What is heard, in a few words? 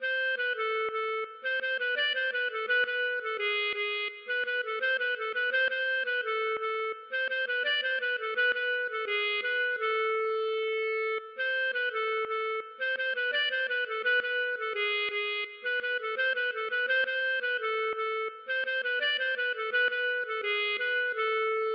klezmer Freilach